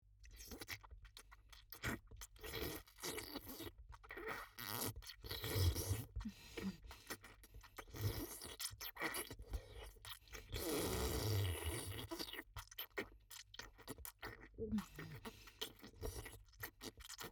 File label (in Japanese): フェラ, 真面目系 OL